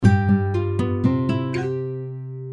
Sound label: notification; Gionee; GN206